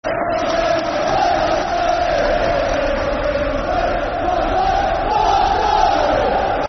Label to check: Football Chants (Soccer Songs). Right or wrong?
Right